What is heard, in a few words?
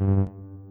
Glitch; GUIPack-Clean&Minimalist